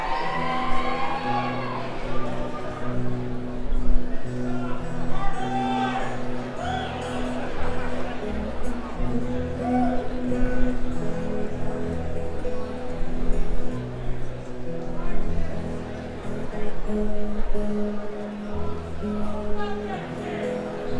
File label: crowd banter; Live in Asheville, NC